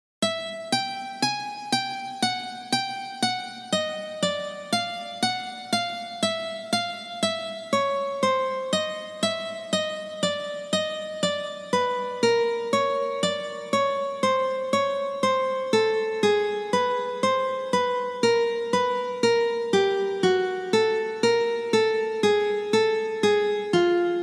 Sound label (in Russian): Uprazhneniya dlya rastyazhki paltsev